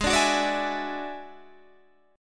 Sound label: F sharp 7th Suspended 2nd Sharp 5th, G sharp